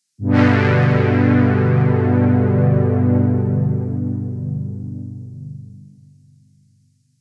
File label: Juno Warm Pad; j106